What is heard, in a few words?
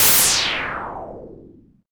HOUSE FX 125